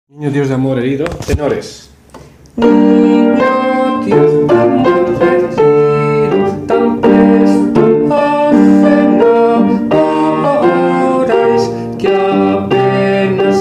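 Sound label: tenor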